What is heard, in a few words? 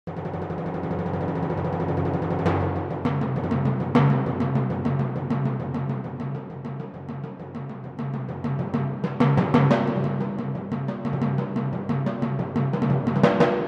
timpani; kontakt player; sibelius